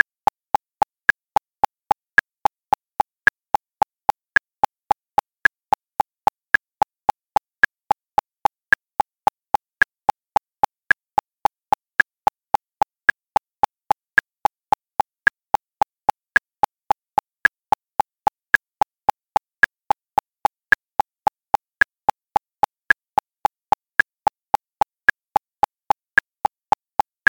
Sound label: Metronome